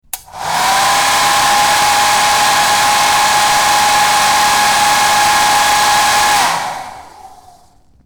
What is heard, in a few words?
Hairdryer